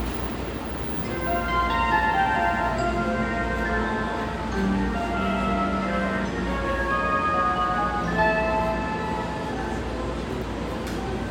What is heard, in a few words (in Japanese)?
JR湘南新宿線浦和北